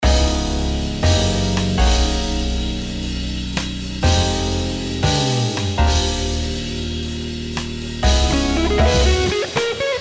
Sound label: improvising mix, guitar